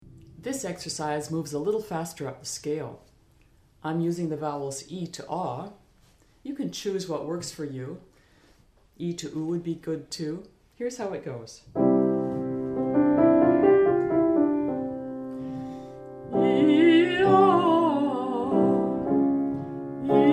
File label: 5 note scale ee ah or o